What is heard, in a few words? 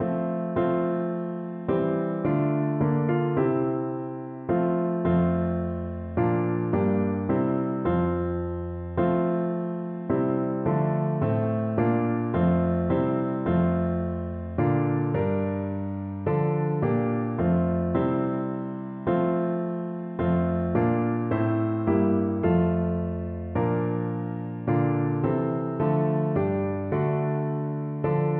sighing cease, piano